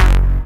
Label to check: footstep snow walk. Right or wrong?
Wrong